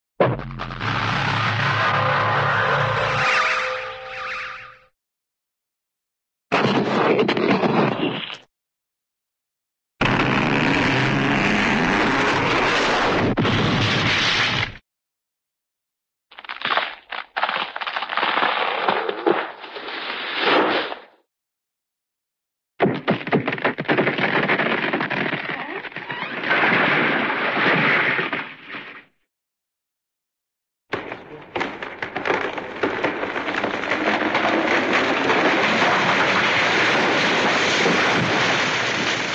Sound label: crash, meteor spacey meteor crash; cartoon